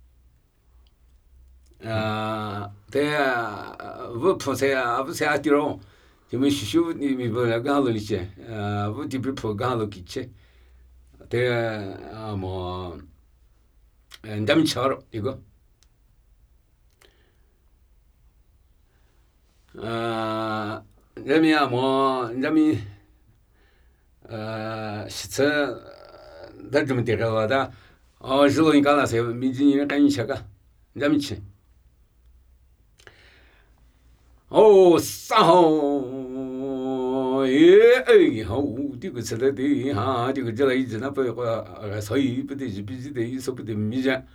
Namuyi Tibetan Ritual Chanting

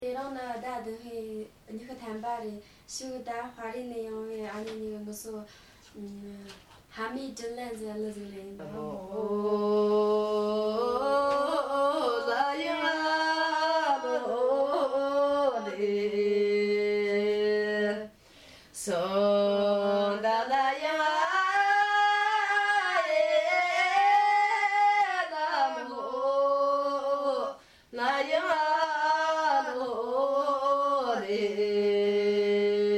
Sitaigou Folk Song

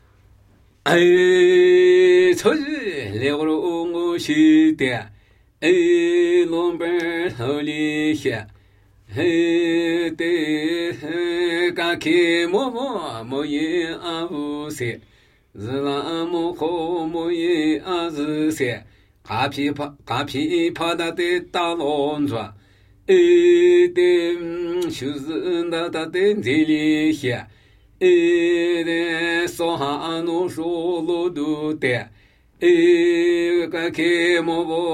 Namuyi Tibetan Ritual Chanting; Drumi mu dzo tso su

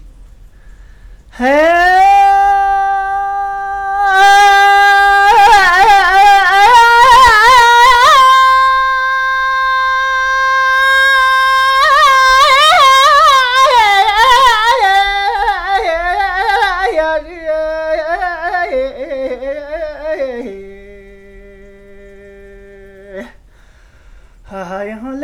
Dkyil 'khor Folk Song

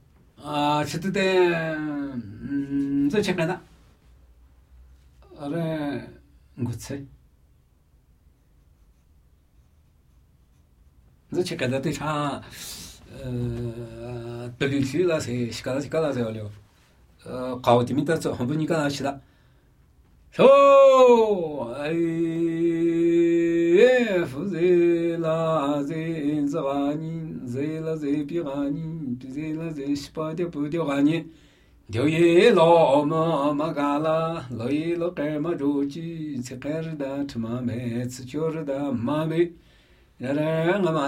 Namuyi Tibetan Ritual Chanting

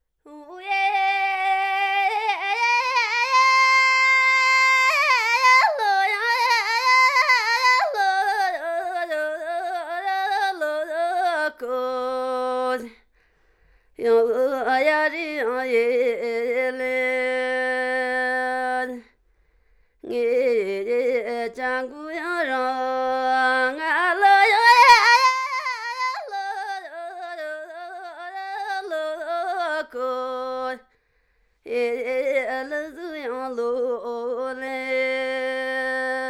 Dkyil 'khor Folk Song

